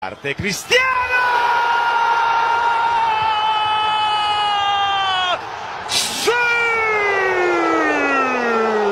siuuu

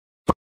item frame

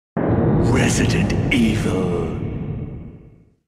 classic start screen; resident evil

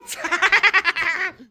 laugh

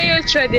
railjet

